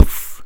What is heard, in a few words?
Beat Box Kick